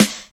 trebly snare a key, snare drum tuned to the A note. Loudest frequency: 2404Hz; snare-drum-samples